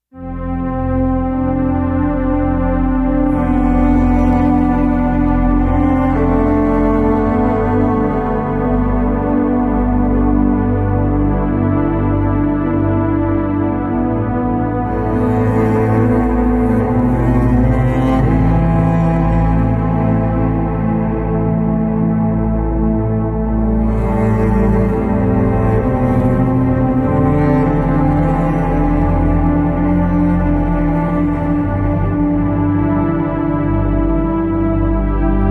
Cello Cloud

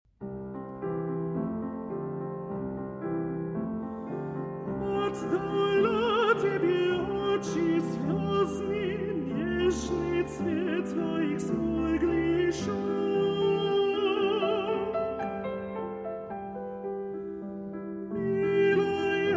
Spanish Songs; The Dark-Eyed Girl

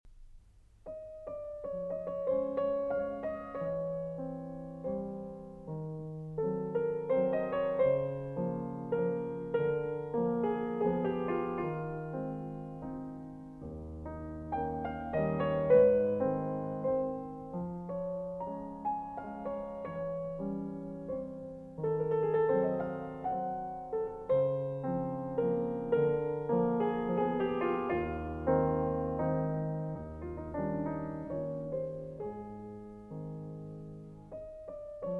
Waltzes, Lento in A-Flat Major

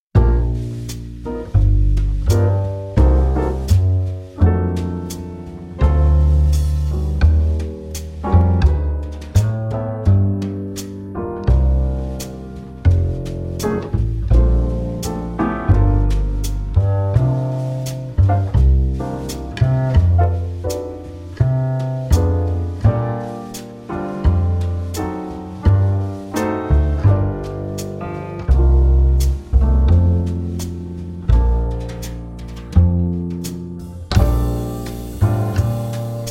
Restaurant Jazz Music

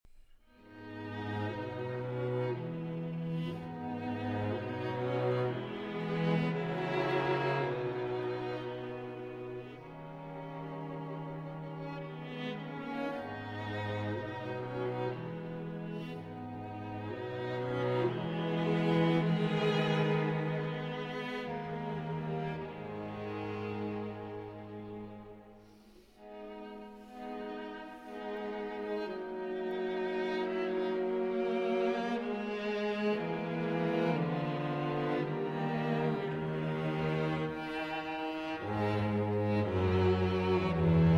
String Quintet in E Flat Major, Larghetto

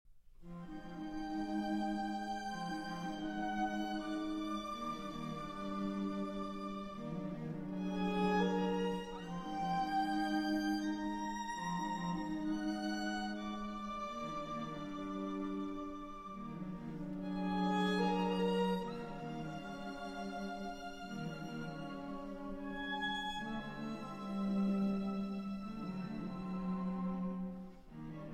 String Quartet

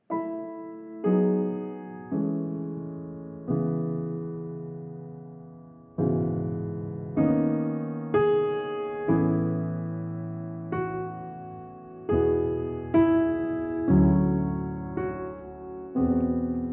Improvisation

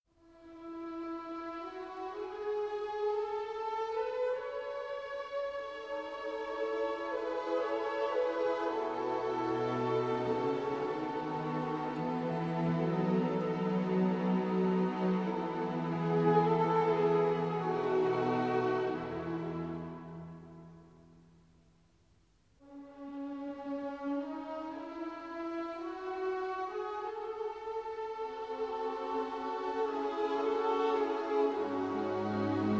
Piano Concerto in E Minor; Piano Concerto No. 1; Op. 11; The Truman Show, 1998

